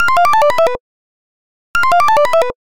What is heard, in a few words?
Computer, alarms; Qua tab PZ LGT32